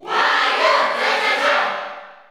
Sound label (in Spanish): Cheer Spanish PAL; Wario; SSBU